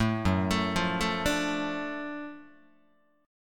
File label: F sharp Minor Major 7th Sharp 5th F sharp down Guitar; arpeggiated